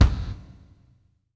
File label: GATE, KICK DRUM; Sampleheads; New York City Drumworks VOL-1